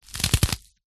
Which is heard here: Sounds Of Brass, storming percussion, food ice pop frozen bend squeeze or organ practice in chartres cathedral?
food ice pop frozen bend squeeze